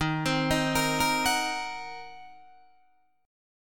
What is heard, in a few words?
D sharp Minor 6th D sharp, down, Guitar, Open D